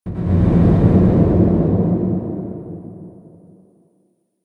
Horror Explosive Impact; Miscellaneous Impact Sound Effects

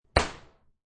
Slap to the body.; Slaps; Combat; SFXsource

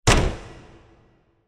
Slammed Door Impact; Bursts; Impacts

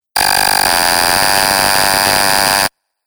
Glitch Dubstep

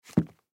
High Heel Set Down Carpet Single; Shoes; Objects; Blastwave; Foley

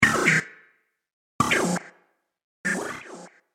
Droid Malfunction, robot sound effects; science fiction sound effect; star wars / trek style games

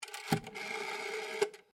TELEPHONE OLD ROTARY BAKELITE DIAL; Bakelight phone; Telephones; Electronics

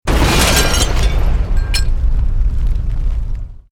EXPLOSION MEDIUM METAL GLASS

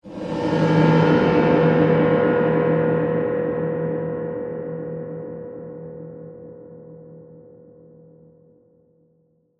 Hit, Cymbal, Reverb; Hit Sound Effects; Sound Design

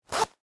Leather Jacket, Zipper, Up; Foley; Clothes; Pocket, Zipper, Open; Dressing; BlastWave

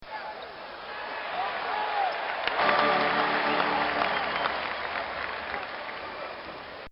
Crowd Cheering; Crowds; Backgrounds

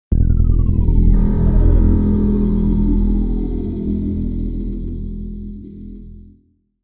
Scifi Audio Logo Dark Beam Concentrated Classic

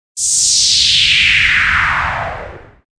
Whoosh

